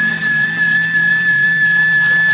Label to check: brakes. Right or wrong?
Right